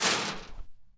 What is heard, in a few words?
chainlink, Footsteps; zps; Foley